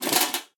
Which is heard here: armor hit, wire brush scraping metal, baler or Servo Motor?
armor hit